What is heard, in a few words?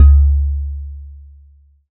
Udu; resynthèses; Diphone Resan